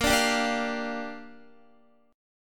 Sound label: A sharp 6th, Guitar; A#6; strummed